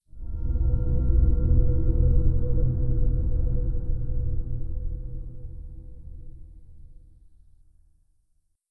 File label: Windows Shutdown; Windows Never Released Soundpack 2